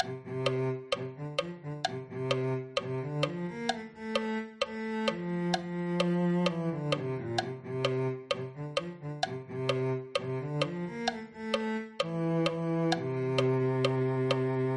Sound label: cello